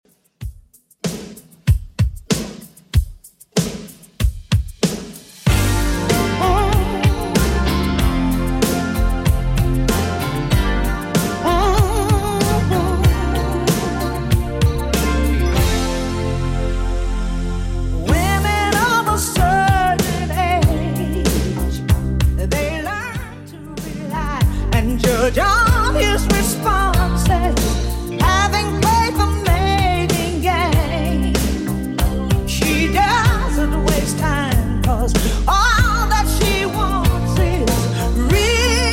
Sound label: 80s Redrum 95 bpm